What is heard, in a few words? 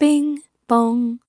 ping pong; gtaiv